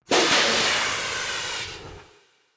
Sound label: train steam blast; Oculus